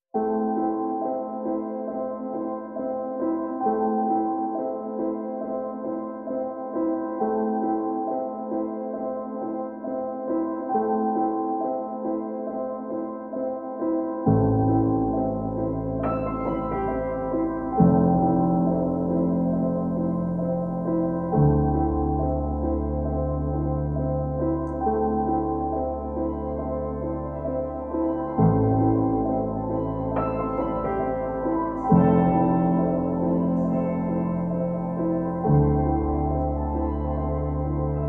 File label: Pianos Only